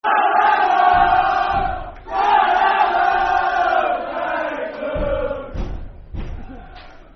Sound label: La, La, La Lalalaaaa, Football Chant (Soccer Songs); Dagenham; Dagenham & Redbridge Drfc